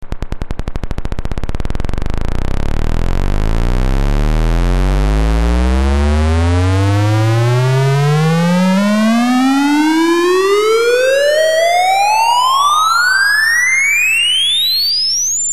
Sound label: 10volt ribbon glide